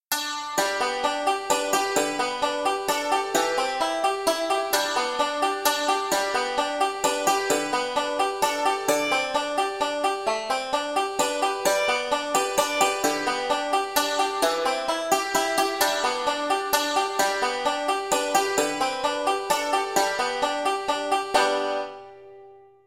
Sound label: arppegio g c d, banjo; 5 string; amazing grace